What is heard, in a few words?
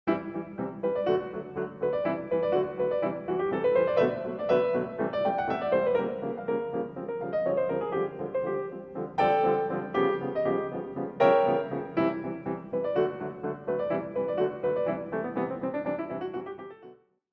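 Sequence, piano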